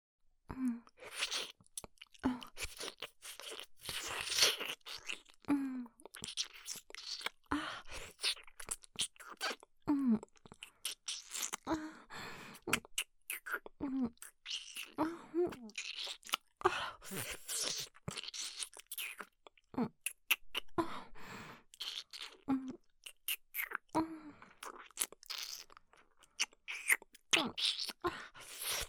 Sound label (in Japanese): 耳舐め, ASMR